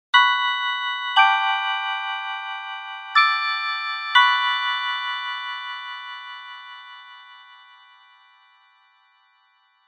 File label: chime